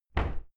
cupboard close normal

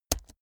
keyboard laptop space press slow hard

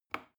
plastic button small push regular

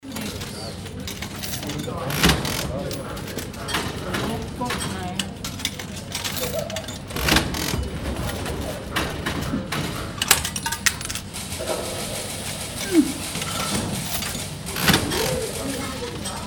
slotmachine

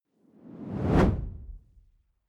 whoosh simple hard incoming

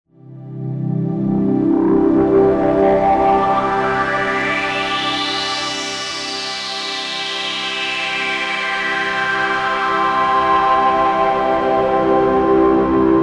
distorted waves

